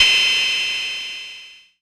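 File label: cymbal, Korg MS-20 Drums; Polyend; Simulacrum Sounds; 1 Shots; Synths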